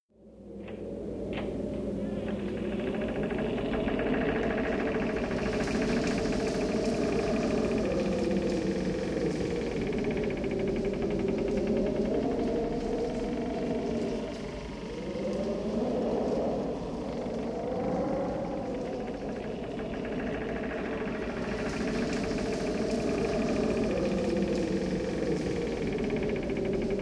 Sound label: Creaking Door; Halloween; Halloween Sound Effects - Sounds Of Horror